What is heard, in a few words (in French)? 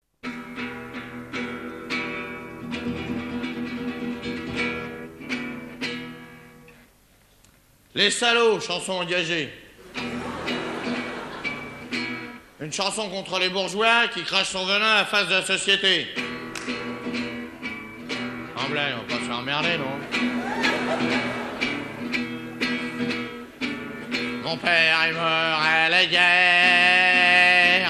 La guitare enragée